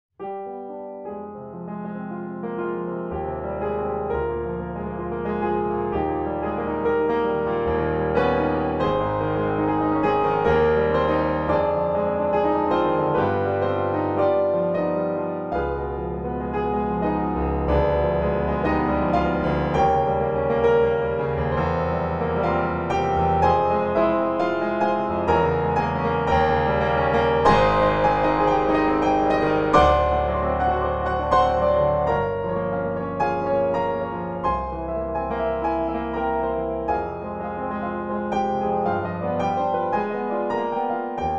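C3 player perspective real dynamic range, Pianoteq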